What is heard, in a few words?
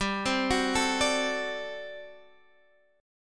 G Major 7th Suspended 2nd Suspended 4th G down Guitar Drop D